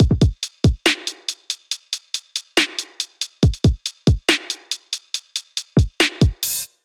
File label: Solstice Kit 70 bpm, Trap Drums; Trap Drums by Sound Oracle; Ableton; Factory Packs